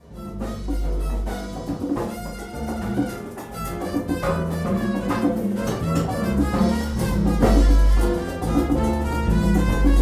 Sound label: vocal